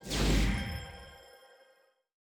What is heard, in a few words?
heal impact; Dd2 dark mage